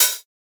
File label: hat; Hats